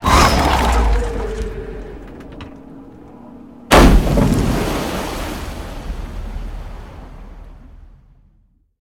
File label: GPS crash; Blind Drive